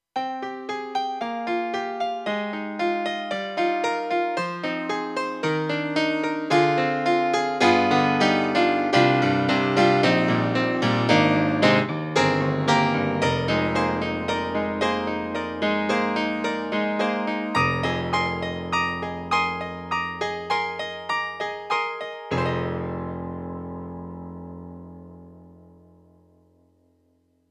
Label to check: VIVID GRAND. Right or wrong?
Right